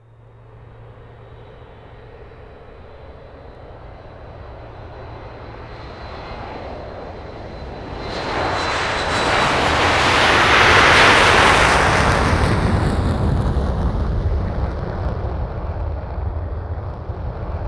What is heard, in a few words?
TAKE OFF, 747